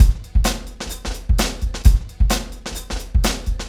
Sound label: Motown, R&B Drums; Legacy; Drummers of Motown